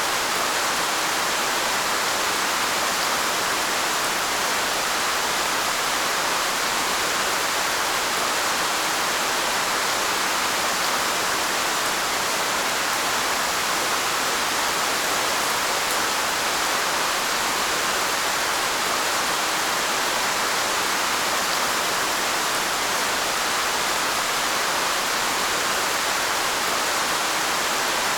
Rain downpour fast; boom library; Thunder And Rain

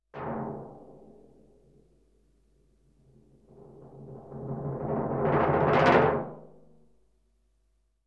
Tympani Roll; KMP Film Video Sound Effects

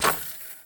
Street Ball Chain Swish; SFX Kit; Street Basketball

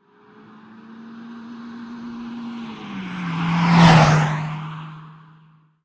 car; Blind Drive

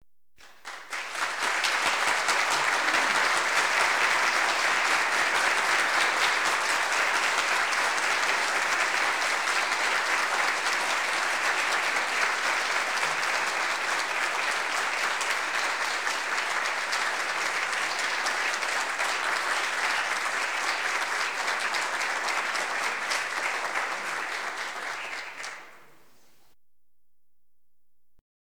Audience Applause; Valentino Production Sound Effects Library; Office sounds

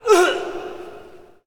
Hockey Male Hurt; Hockey Vocal Sounds

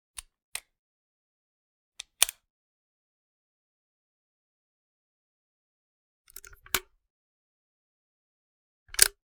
.357 Pistol Open & Close Chamber; Hollywood Edge; Heavily Armed

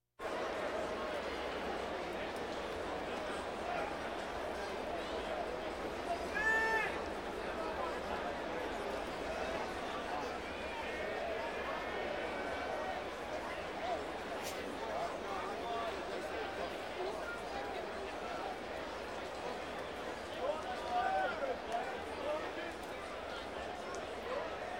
Crowds Race cars Baseball

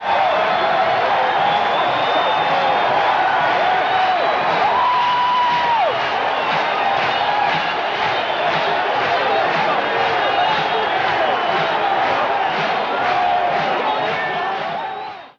Baseball Crowd Cheer; SFX Kit; Crowds

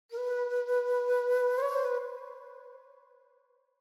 Flute Short Light Flute Melody; Hollywood Edge; sound designer's toolkit v2

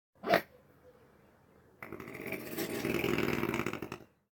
swan; best service Blue Box

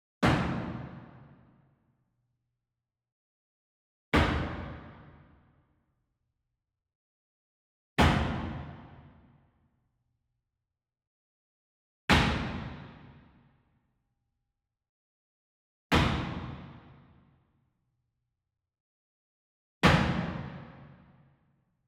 Sweetener Wood Mallet Corner Hit Far; boom library; Cinematic Strikes Construction Kit

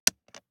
DICE on Plastic, Drop, Standard, 3 Three Dice; Samples; ArticulatedSounds; DICE Sfx Party (Extended)

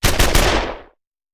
Machine Gun; Sound Ideas; Guns

